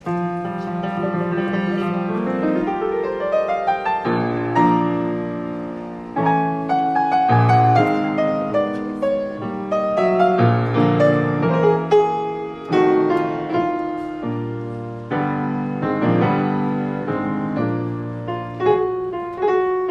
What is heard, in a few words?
piano; sunshinecoast